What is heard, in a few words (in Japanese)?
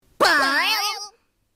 ポイ～ン！